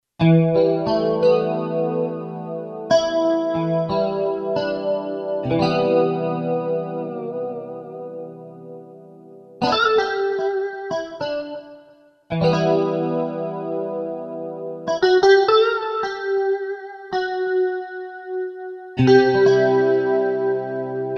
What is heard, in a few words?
JC Guitar; Roland SC-880; Factory Patches